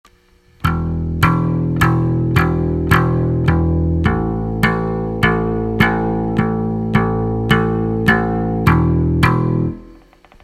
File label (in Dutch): slap bass les oefening; bassguitar